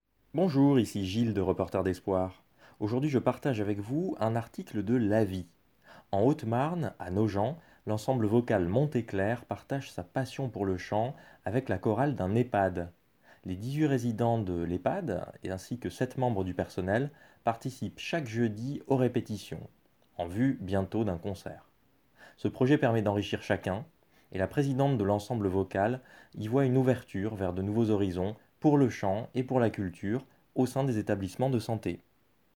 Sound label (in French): ensemble vocal